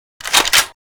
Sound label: bolt; awp; bootysniper; ptsd; slender